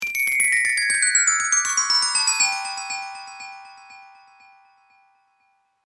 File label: Chimes High To Low Slow Delay, Windchime